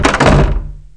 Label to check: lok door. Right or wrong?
Right